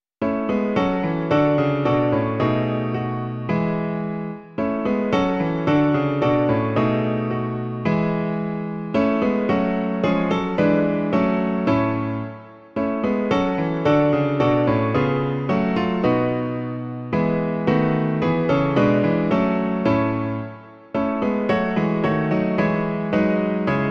klavír; Veselme se, věřící; Klavírní verze

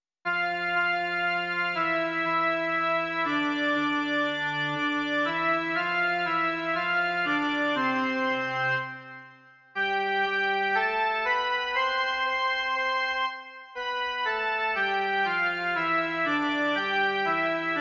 Svatý - jen melodie; Doprovody Farského liturgie

